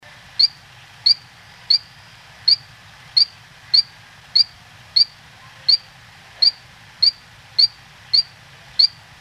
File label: Ameerega altamazonica